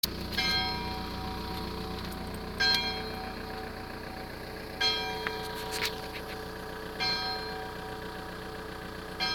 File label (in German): Glocken